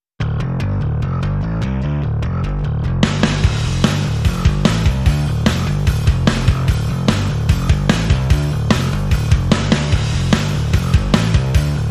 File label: Drums and Bass